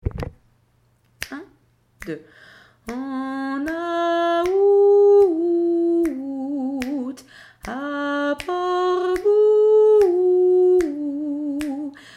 vocalise

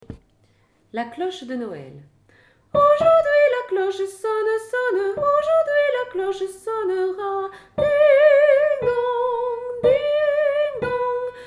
la cloche de noel

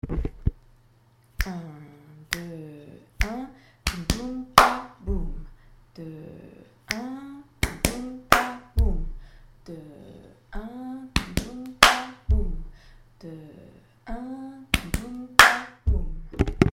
rythme corps et voix en boucle

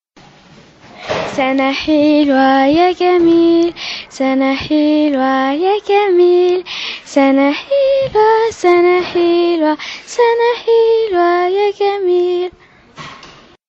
arabe joyeux anniversaire

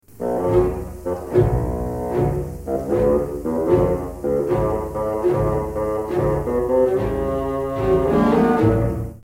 Le basson

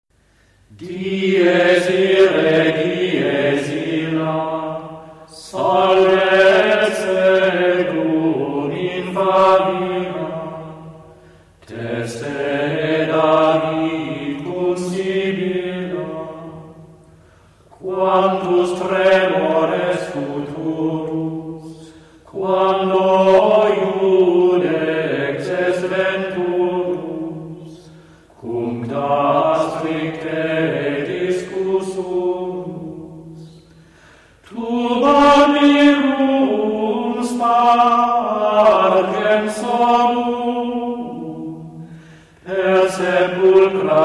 extrait dies irae chant gregorien

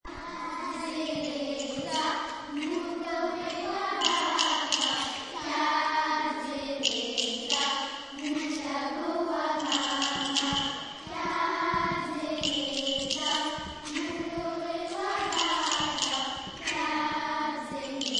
Chant

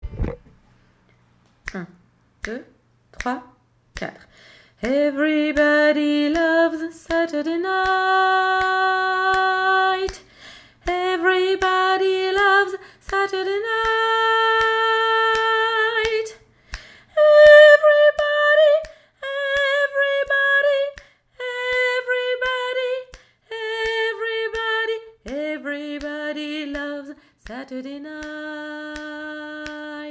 vocalise

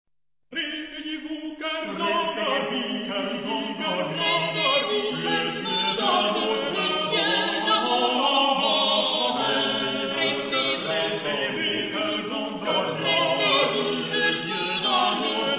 extrait du chant des oiseaux debut

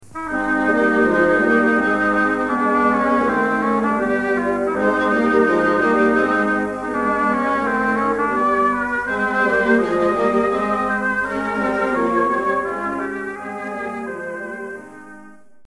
Le hautbois

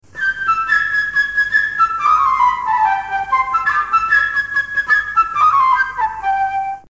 la flute

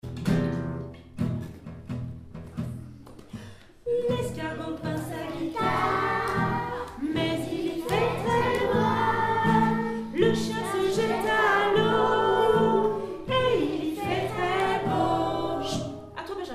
Jeu vocal

